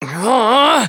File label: battle pain; dqxi; eleven